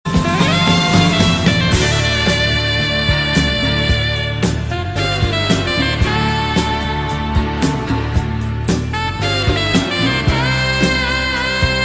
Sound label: sax, instrumental